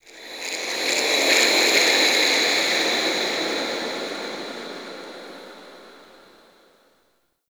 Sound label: Zariman Factory Texture, Ambience; Warframe; The New War